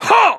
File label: kiai; MonsterHunter; Fight